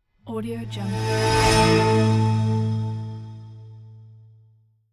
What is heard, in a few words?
logo transition; fx-library